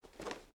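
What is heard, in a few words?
footstep armor; Smite; god Kuzenbo v2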